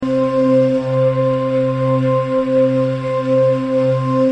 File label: ethereal Flute For Meter Loop; wave interference